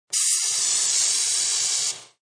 Compressed air from air compressor while filling car tire at gas station; Air Pumps; Compressed Air Quick